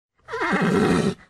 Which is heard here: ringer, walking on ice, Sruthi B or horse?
horse